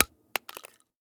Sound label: Shell 12ga carpet; STALKER; IWP